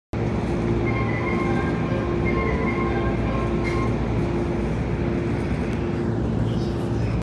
埼京線 板橋 北, train sounds

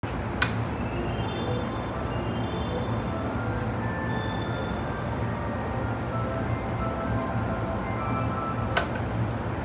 京浜東北線鶴見南, train sounds

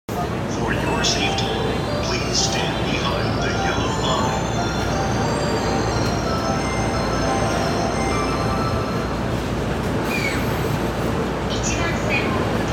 京浜東北線南浦和南, train sounds

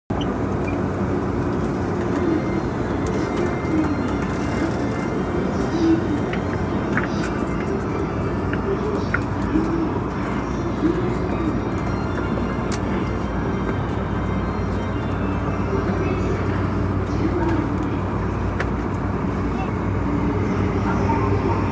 総武線 快速 船橋 西, train sounds

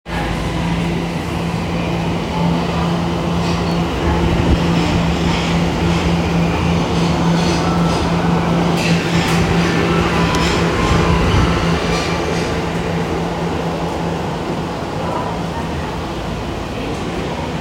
銀座線外苑前西, train sounds

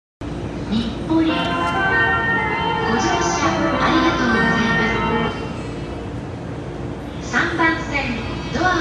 JR 常磐線 日暮里 南, train sounds

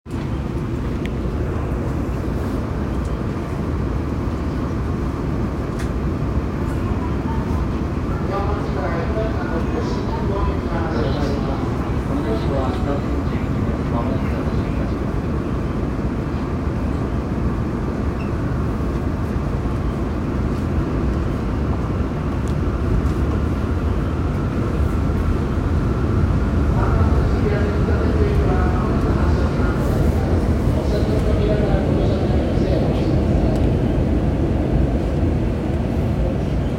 日比谷線中目黒北

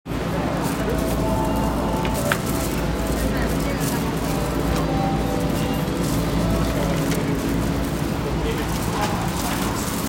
銀座線末広町東, train sounds

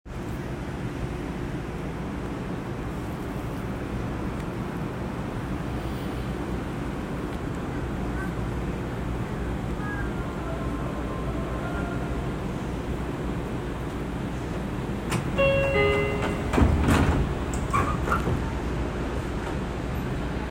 池袋線仏子西, train sounds; 西武

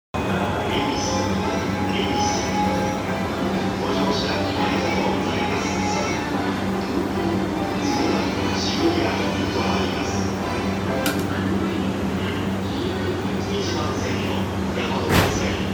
JR 山手線 恵比寿 CW, train sounds

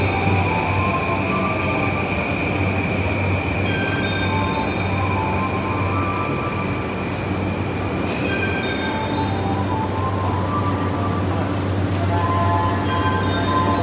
JR 常磐線 東京 北 接近, train sounds

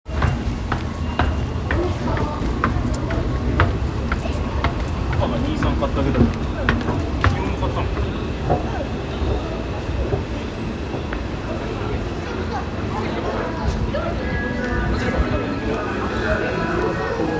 京王線府中東接近

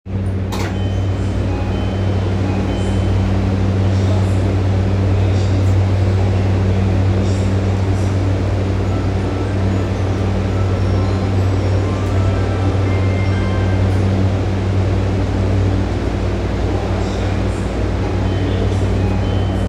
半蔵門線住吉西, train sounds

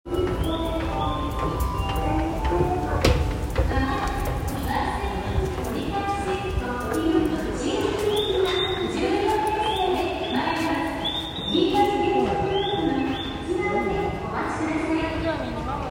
線京王八王子東接近, train sounds

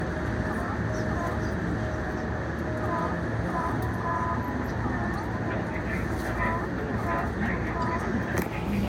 JR中央線中野西, train sounds

